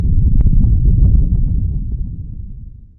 volcano rumble; Nature; weather

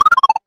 dialogue start; NPC Dialogue

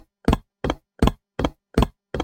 horse trot; Vehicles; mounts; freepixel

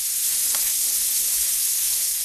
cooking sizzle; Crafting

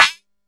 dash, character voices

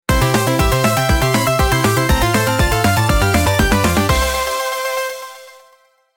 victory fanfare; Stingers; freepixel

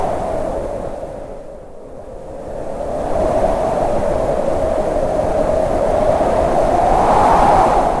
wind howling; Nature; weather

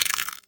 food eat; Items & Pickups

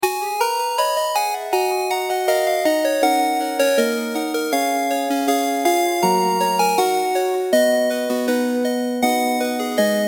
intro cinematic, music

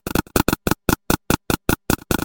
footstep stone

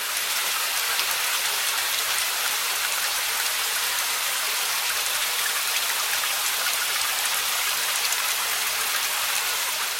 rain; freepixel; Ambient; ambient loops

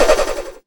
flail swing; Weapons; freepixel

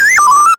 computer beep, sci-fi

